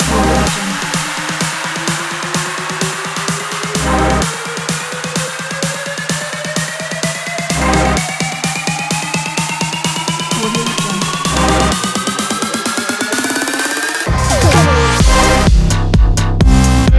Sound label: an electro